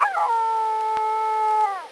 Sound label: Coyote Record